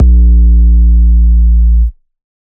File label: Bass, Dubstep 808 Basses; GhostHack